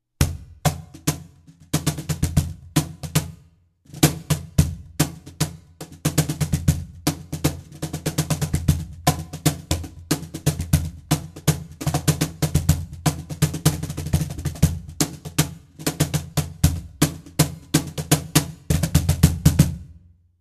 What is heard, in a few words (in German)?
Pentajon Snare Seite; Jose Cortijo